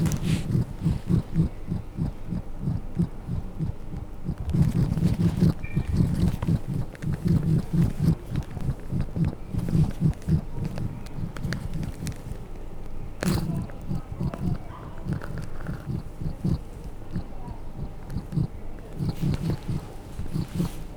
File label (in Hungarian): közvetlenül a mikrofon előtt szuszog, matakó; jaszberenyi zoo 2016